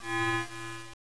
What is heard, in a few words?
collide